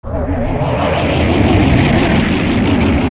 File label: Scream; King Dodongo; zelda; OOT